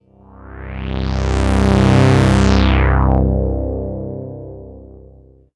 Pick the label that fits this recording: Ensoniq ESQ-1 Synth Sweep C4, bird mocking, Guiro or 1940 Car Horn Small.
Ensoniq ESQ-1 Synth Sweep C4